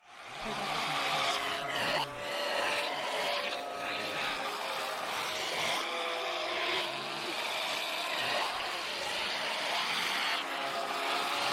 zombie; soundscrate

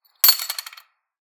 coin drop metal tray; soundscrate

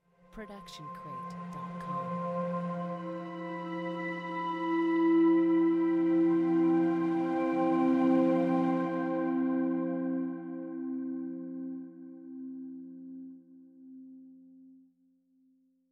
slow motion pad

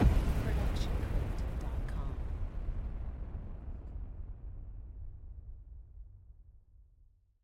Cinematic Hit

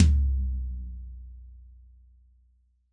tom floor; soundscrate

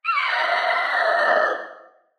dino screech; SoundsCrate

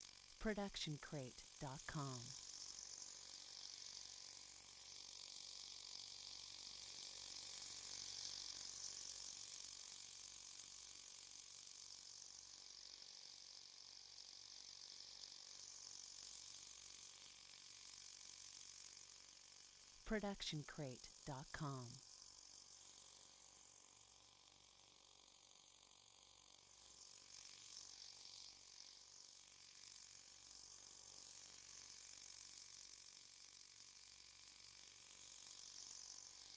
hovering drone; soundscrate

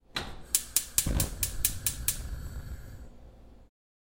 stove top; soundscrate

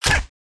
silencer

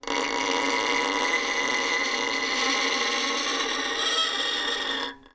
Creaky Screetchy Noise Long; soundscrate

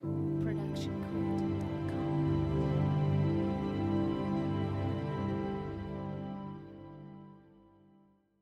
Inspiring Ident; SoundsCrate

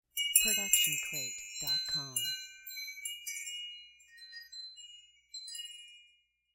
Wind Chimes B

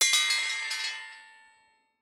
Metal; SoundsCrate

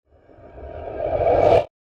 horror rising ghosts; soundscrate

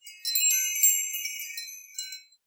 Wind Chimes B

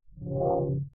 metal swing; soundscrate

